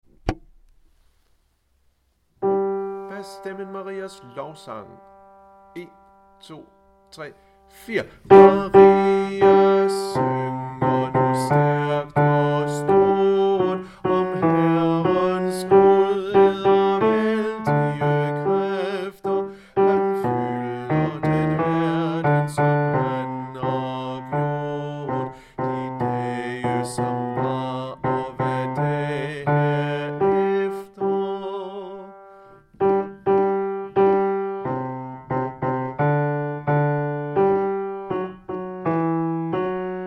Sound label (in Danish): bas; Marias lovsang